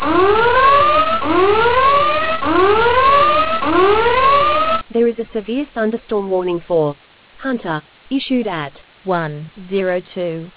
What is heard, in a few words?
storm audio